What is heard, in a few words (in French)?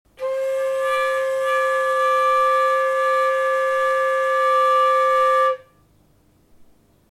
sons multiple